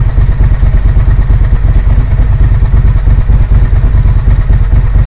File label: Vehicle Idle; cstrike